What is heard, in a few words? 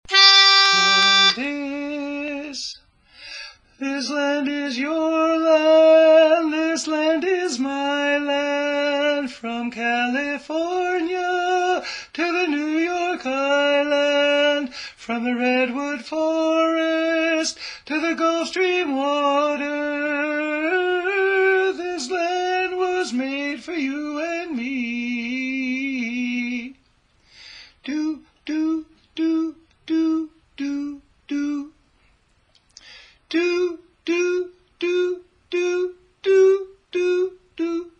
Tenor; for god & country music